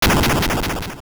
Genie Bottle Smash; Genie's bottle smashed (also large statues smashed with the L2 Power Bracelet, and Hot Head's shell falling off)

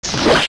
Poe Hit; zelda; OOT

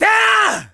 Great Spin; Link's Great Spin (charged sword-spin)

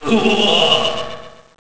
"Huhahaha"; Gustaf: "Huhahaha..."

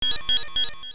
Leaves; Leaves falling from the Maku Tree (heard after completing dungeons); Zelda Oracle

